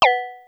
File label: Synth, Techno Blip